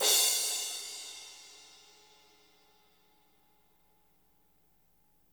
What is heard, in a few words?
CRASH, natural kit; Spectrasonics; Burning Grooves CD2